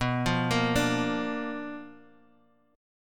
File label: B-Minor Major 7th Flat 5th B down Guitar Half Step Down; BmM7b5